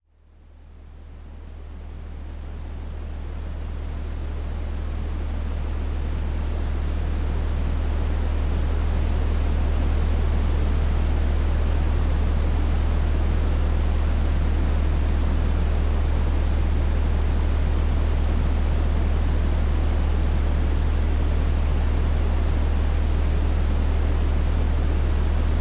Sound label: Brown noise; Focussed Relaxation